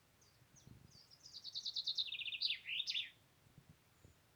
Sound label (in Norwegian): fugl